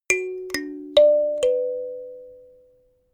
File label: notification kalimba sequence minor